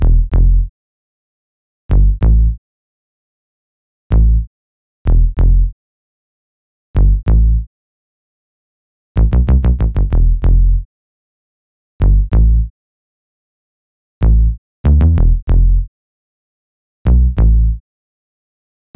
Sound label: Synth loop 95 Am, Synth Bass Loops; Slate Digital BASSHOLE Sample Pack